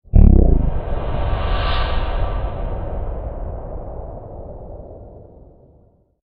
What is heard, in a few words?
Death Player; Bit Dungeon III